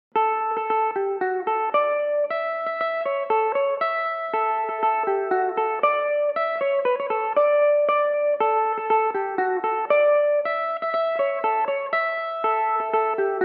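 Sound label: D major belmuse, irish mandolin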